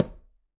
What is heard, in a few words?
Quinto B Mute, Conga, Latin Percussion; Drums; Drum Hits; Ableton; Factory Packs